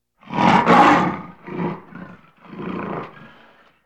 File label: Tiger Snarl; Halloween Sound Effects